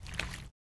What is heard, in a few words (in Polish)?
kroki po rybie; apocalipsis